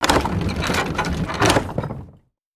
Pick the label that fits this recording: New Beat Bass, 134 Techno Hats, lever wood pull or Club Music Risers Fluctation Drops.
lever wood pull